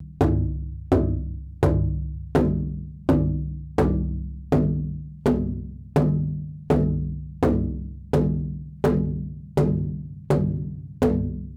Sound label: Big drum; Little One (Teetich)